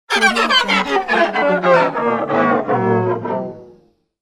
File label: violin broken slowdown gliss